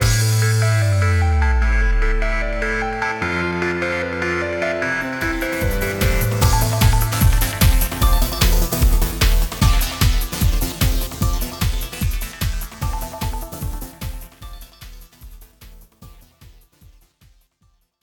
Stereo, Stinger

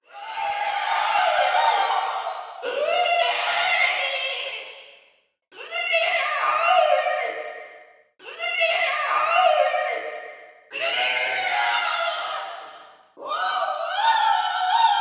vo mad screams

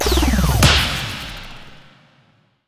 UI Check Box Slam; Taps to Riches; Android

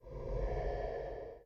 Menu Low Tone; Lucius II The Prophecy

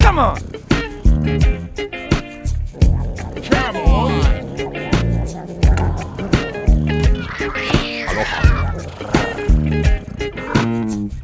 Funky Aloha; puss soundtrack

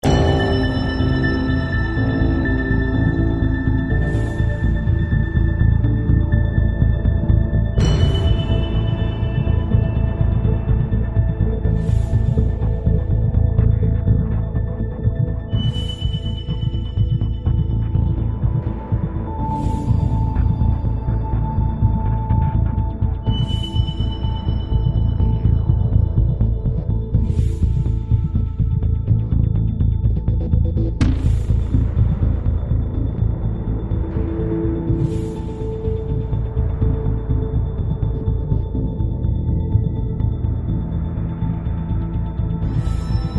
Loop Tense

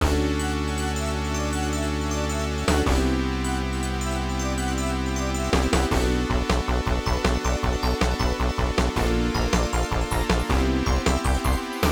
Stage; gunbird 2 arcade 1998; Gunbird [United Kingdom Stage]

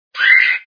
Birdy; Super Mario 63

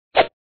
swing; pencak silat 2 2002 gamerip online

